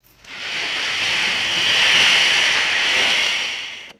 heavy theater curtain pulled on squeaky track; cloth

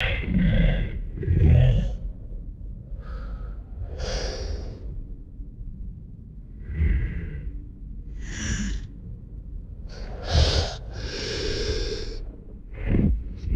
pulsing synth drone with alien breaths; magic-scifi

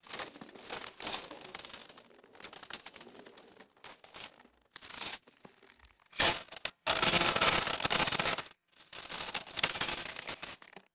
metal coins vibrating and clinking on dry ice

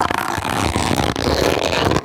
squeaky fake leather stretching; rubber

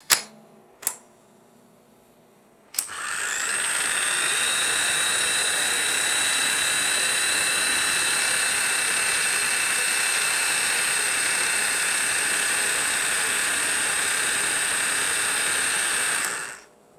electric ball pump motor starts and stops; machines

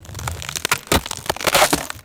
sharp brittle ice crunching and cracking

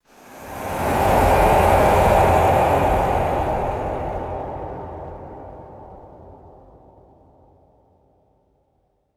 dark ethereal atmospheric wind swell

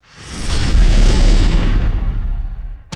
fast synthesized whoosh and deep thump

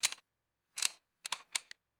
metallic rifle clip insertion; guns

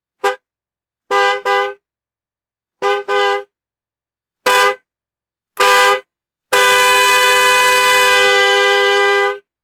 six exterior car horn blasts

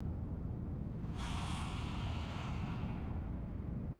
distant short gas purge blast and hiss, aircraft

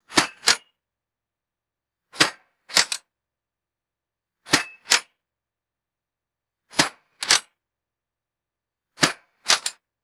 light metallic impacts and mechanical clicks; weapons

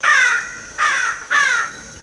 three harsh crow caws; birds

